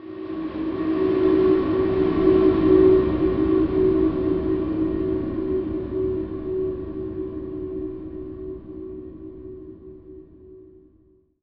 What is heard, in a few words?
Transition Stab Dark Ghostly; Production Element